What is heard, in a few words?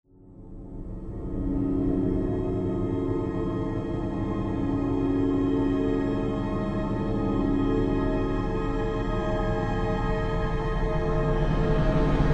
Spooky Bit